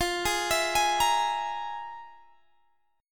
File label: F Minor Major 7th Double Flat 5th, Guitar, Half Step Down